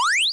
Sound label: 8 bit kit jump; LO FI and 8 BIT KITS; 8 Bit Videogame Kit